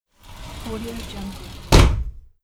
sliding track door

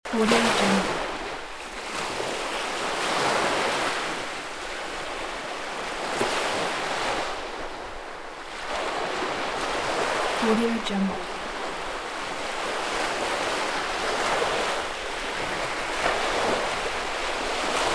shallow sea waves ambience loop

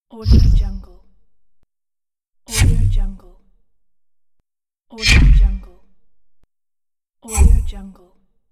ceased warp scifi spaceships stopping

